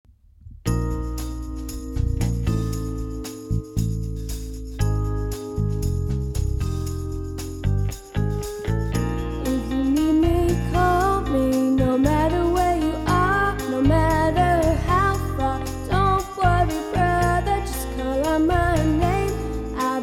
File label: Vocal